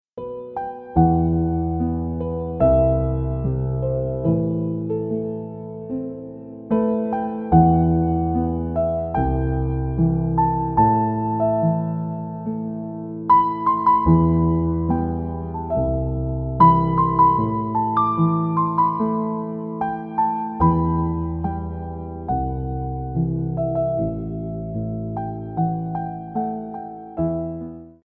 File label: piano cover